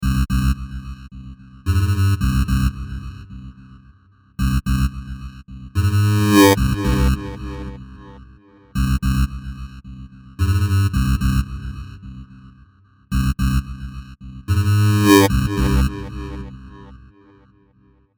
sub boom bass

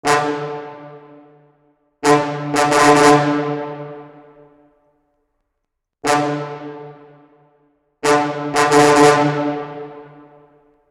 Lo Nasty Brass Osti

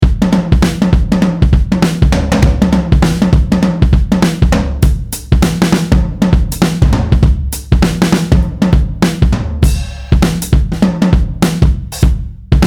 smooth stereo beauty

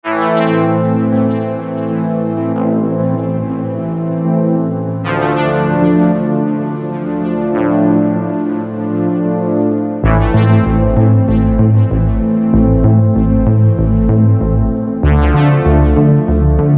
Syntronik TR5 Really Pushed

